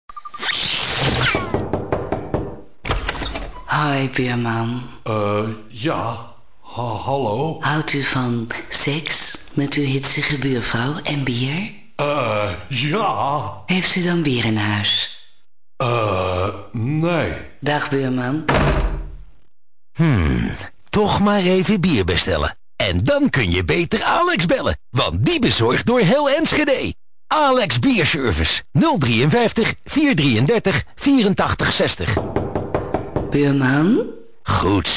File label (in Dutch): reclametune